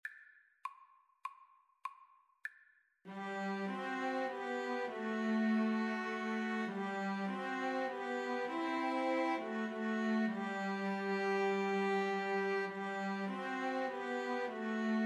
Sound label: be still my soul, 2 Violins Cello